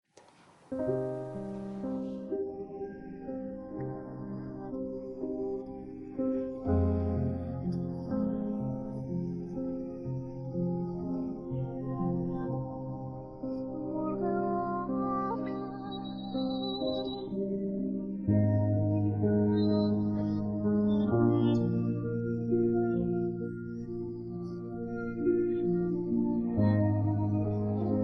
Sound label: karaoke